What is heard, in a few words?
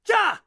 cry; True Crime Streets Of LA; XBOX